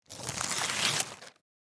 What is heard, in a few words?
page transition